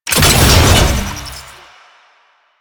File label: Research Pod Stop; JadeShadows; Ascension